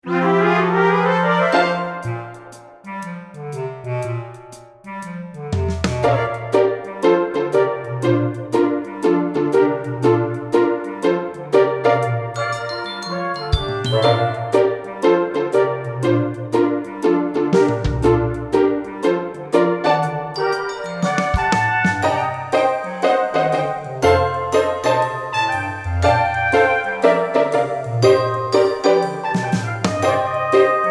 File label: Soft Shoe